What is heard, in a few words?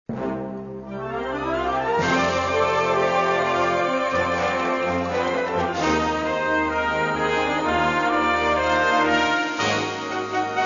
Jingle Bells Fantasy, posaunenchor